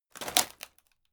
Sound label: wood break